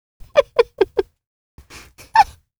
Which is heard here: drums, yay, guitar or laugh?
laugh